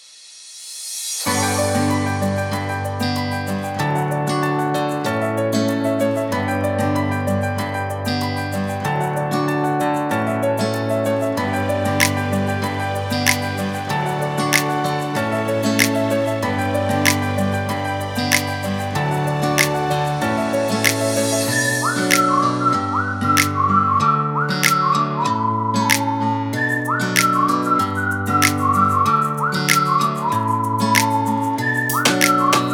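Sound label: ispanyol latin reggaeton; By Burak Sound Bank; latin ispanyol tema vol 3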